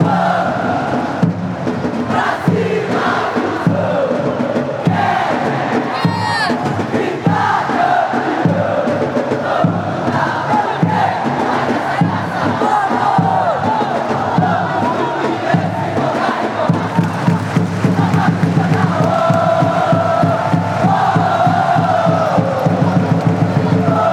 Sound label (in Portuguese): quero gritar campeao, fan chants